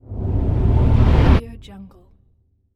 reverse sfx

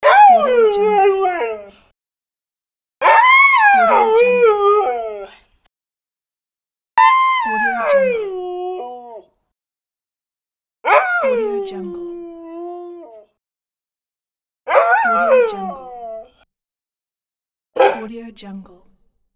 dog whining and barking

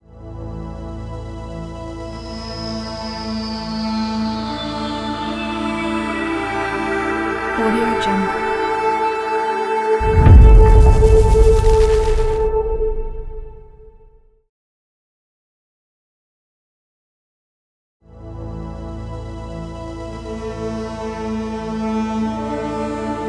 retro space synth logo

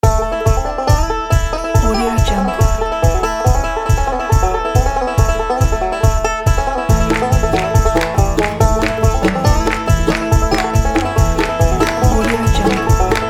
barn stomp; flamingomusic

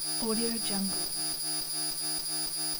scifi computer telemetry

